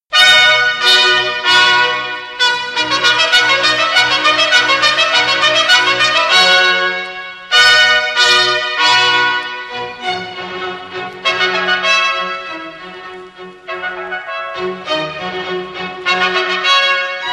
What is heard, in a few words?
CONCERTO FOR TWO TRUMPETS AND ORCHESTRA